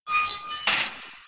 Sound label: gate